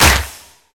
hand clap sound c key, Royality free hand clap tuned to the C note. Loudest frequency: 3618Hz; percussion; single hits one shots